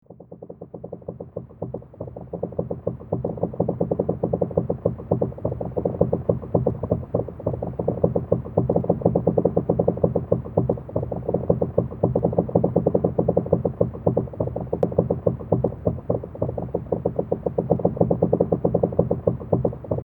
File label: Creaking